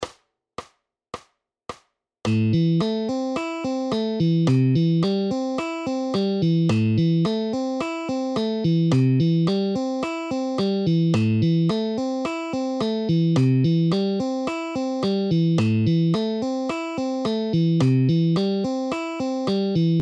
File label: Rhythm guitar exercises